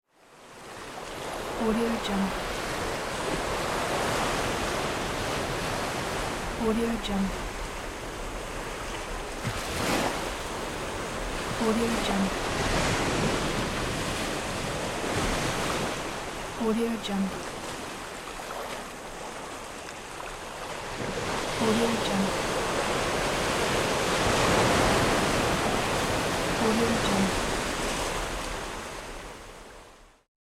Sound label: waves; tr-sound